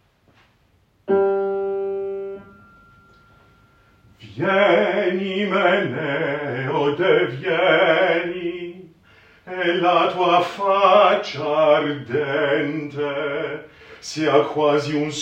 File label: bass sung; Vieni imeneo